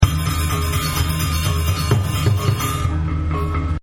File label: Gamelan, Live Music Events; Concerts; Backgrounds